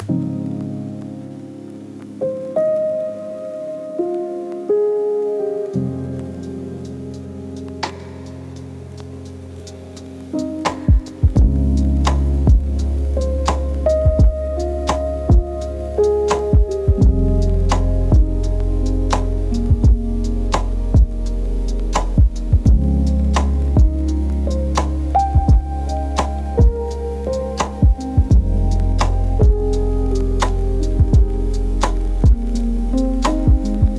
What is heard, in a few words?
emoi lofi piano for recall and nostalgia